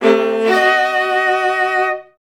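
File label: violas D#3; Best Service; Peter Siedlaczek's Advanced Orchestra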